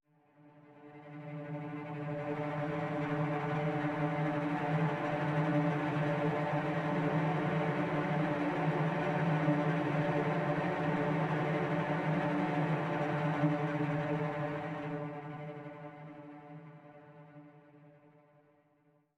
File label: Jungle Suspense Strings